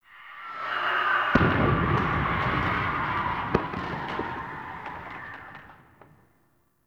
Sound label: Ambient Distant Infested Explosions; Warframe; Nineteen Ninety Nine